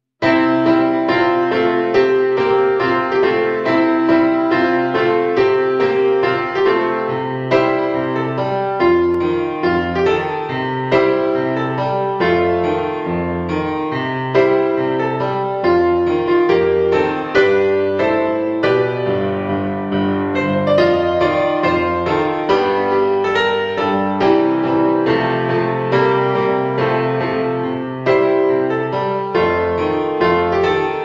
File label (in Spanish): versión de piano; Barrio Sésamo